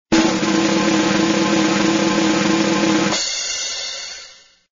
Drum Roll

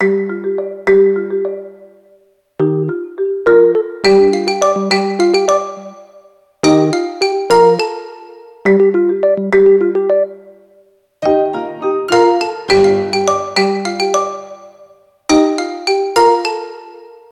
Game, ringtones; Yota Yotaphone

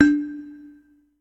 Red Wine Cork, notifications; Meizu X8

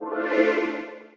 presence online, Nokia 770 Internet Tablet

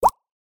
notification beep sound; Xiaomi Mi-CC9

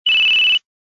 Loud Beep Once; LG VX5500

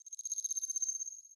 Unlock, phone ui; NGM Vanity Smart

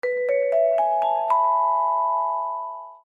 Vibraphone, phone; LG

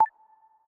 Tap tension; Samsung Galaxy Tab E 7.0 SM-T116BU

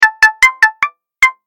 Plip plop; Alcatel Vodafone Smart II

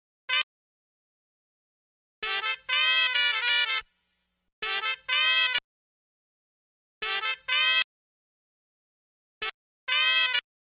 Horn; miniBAE source code audio

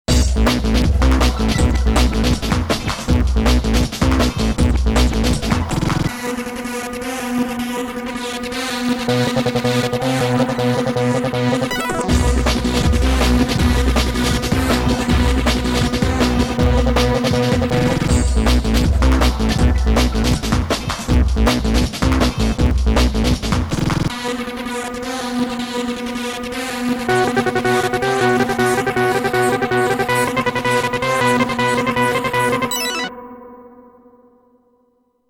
Majestic Dance; SMAF Entertainment

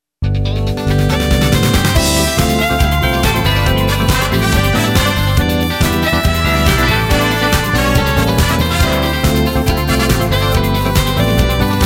Tune; LG; CU320; Audios

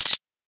camera click

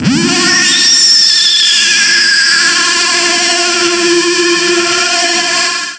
Screamy; Philips; Multimedia Studio

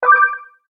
Missed Call, notifications; Kyocera DuraForce Pro

